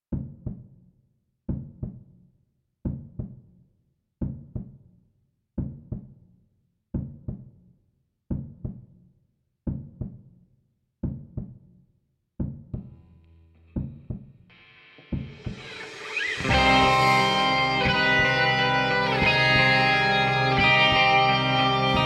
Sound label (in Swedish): gitarrmix